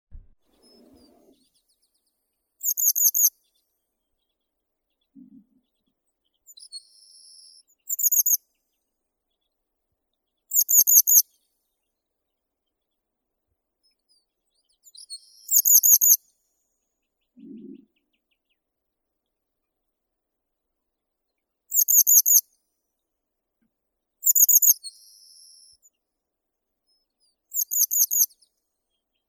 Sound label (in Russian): zvuki prirodi tryasoguzka vostochnosibirskaya motacilla tschutschensis